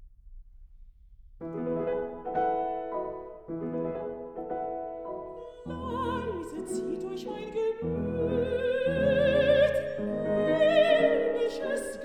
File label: Songs for voice & piano